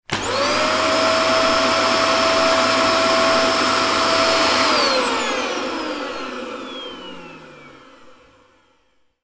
household dyson upright vacuum cleaner switch on short burst off